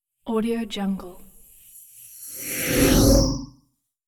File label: heavy incoming whoosh without tail